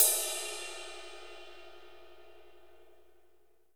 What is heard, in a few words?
big band fast; Roland L-CDC-01